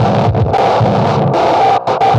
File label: HARDCORE, PHUZZ BEATS; Spectrasonic Distorted Reality 1